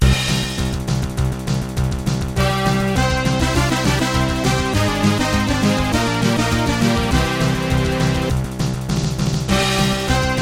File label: Multiball Jackpot Collected, pinball; hook data east pinball soundtrack